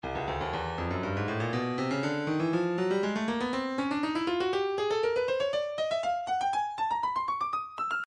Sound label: breakbeat midi files 120 121 bpm 120; Stylus